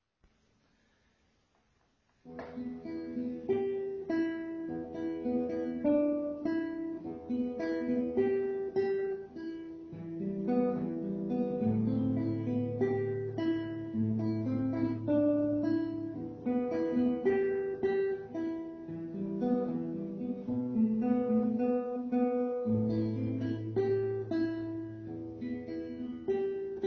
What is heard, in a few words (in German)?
Gitarre